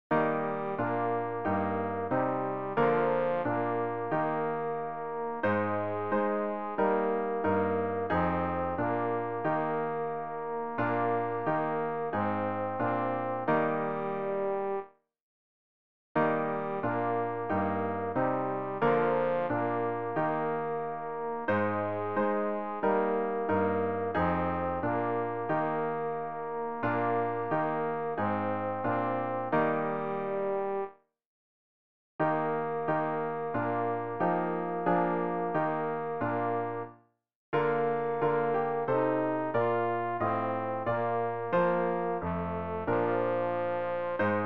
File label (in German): tenor; ref-gesangbuch; hirte deiner schafe